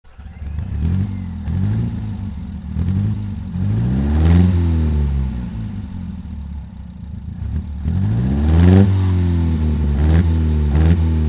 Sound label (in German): Singer Nine Le Mans - Standgeraeusch; Le Mans Renngeschichte; Singer Nine Le Mans Vorreiter